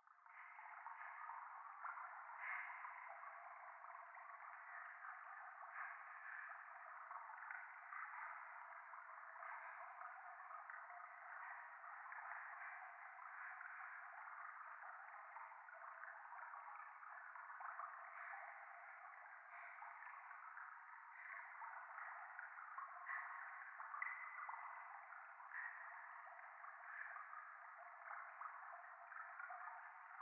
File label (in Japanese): 廃墟 左耳快感吐息 川のせせらぎ